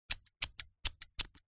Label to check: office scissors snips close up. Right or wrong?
Right